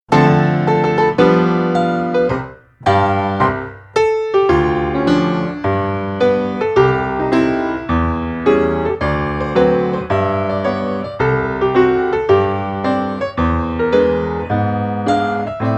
Grands Battements en Ronds & Développé Battements, ballet; adv 1 ballet